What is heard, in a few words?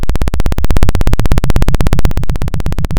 Sound of a plane taking off